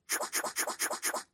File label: laughter emote; animal crossing